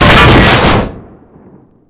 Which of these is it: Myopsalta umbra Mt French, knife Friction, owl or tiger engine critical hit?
tiger engine critical hit